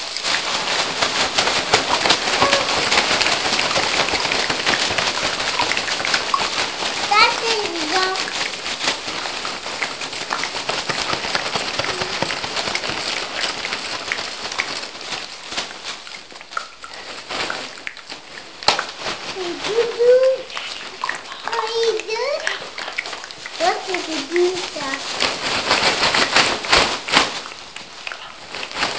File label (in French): pluie avec les sacs et papiers et les bouches